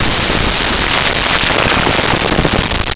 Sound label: Med Caliber Hand Gun